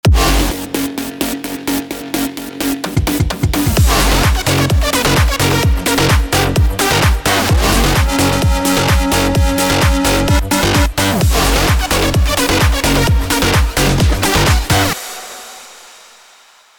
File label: bass house